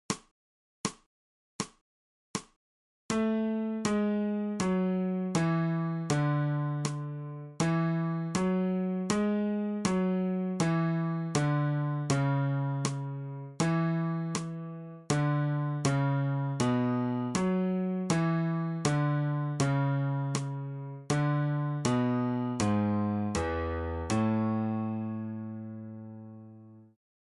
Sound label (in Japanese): 新フレットレス